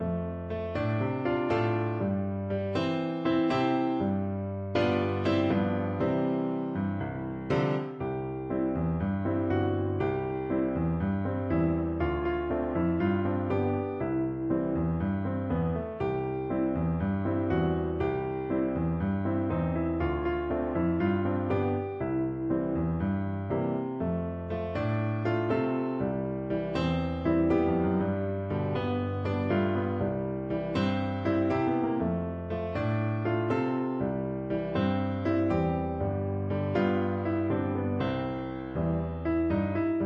PNO, piano

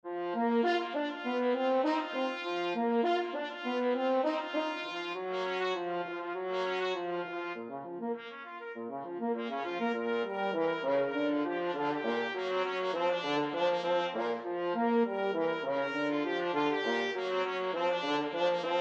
rag, trumpet french horn duet; maple leaf rag

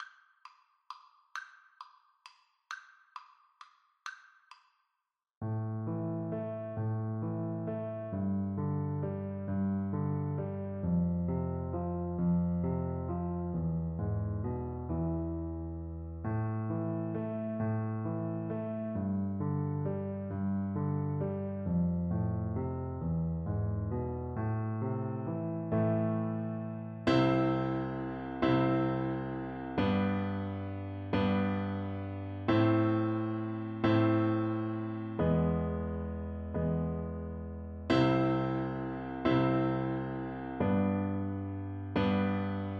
piano duet

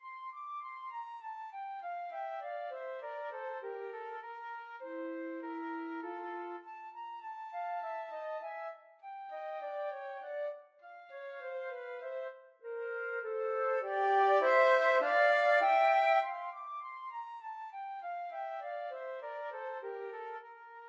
la virtuosa flute duet

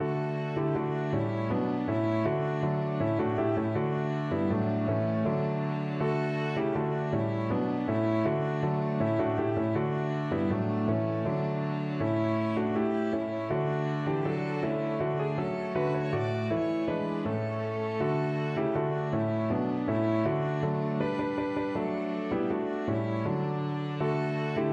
deck the halls, piano quartet

